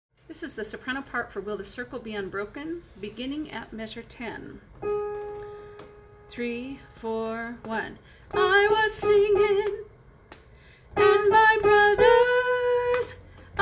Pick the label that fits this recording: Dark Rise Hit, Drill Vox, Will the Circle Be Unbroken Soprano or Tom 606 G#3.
Will the Circle Be Unbroken Soprano